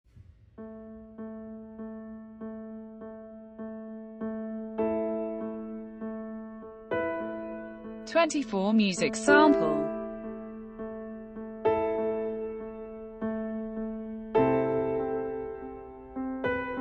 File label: andante lugubre; sonata 4